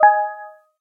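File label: whisper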